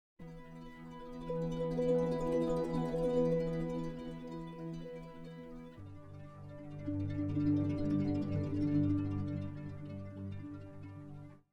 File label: 172 BPM B Min Harp; RnB; Melody; Loop Stems; Cymatics; 2022 Melody Collection; Houston Dancing